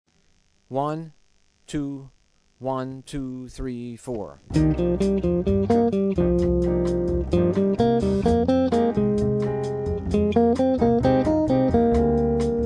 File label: Asc; Penta Patterns